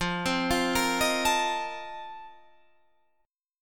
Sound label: F 11th F, down, Guitar Drop D